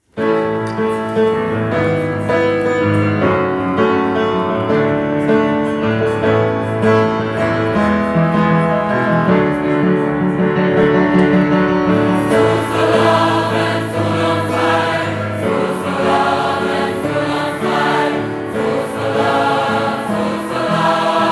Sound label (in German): 4-stimmiger Kanon